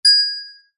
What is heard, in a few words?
bells small bell shop door ring; zapsplat